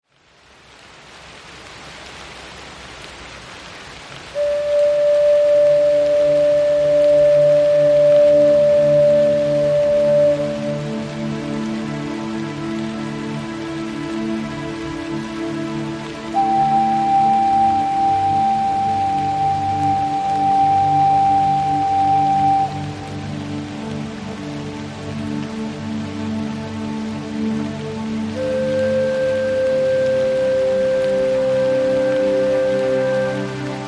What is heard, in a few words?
Shamanic Dream (Shamanism)